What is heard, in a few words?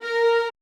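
VA Q F#A3-R, viola, orchestra; AKAI Advance Orchestra Upgrade 97 Vol.1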